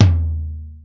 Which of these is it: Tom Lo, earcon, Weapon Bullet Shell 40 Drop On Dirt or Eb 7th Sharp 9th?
Tom Lo